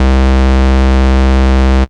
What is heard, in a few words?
Basses C; Vengeance Samples